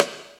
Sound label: Rim Shot, X Drum LM8953; Drum Samples